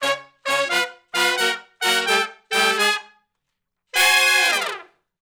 Sh Bls Clmb Up, Dwn to V Combi (Db) har, Horncraft for R&B; Crimson Blue and Fabulous Horncraft 4 RnB; Sections